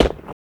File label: foot stone, footsteps; midnight guns